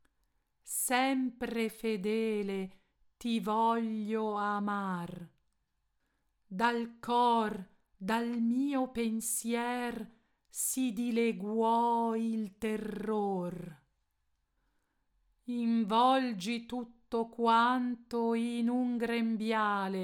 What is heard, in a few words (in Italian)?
estratti arie vocali semilegate